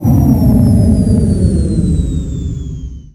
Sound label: Shutdown